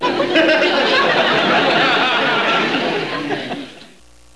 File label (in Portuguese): risadas; sons humanos